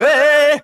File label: "whey", Voice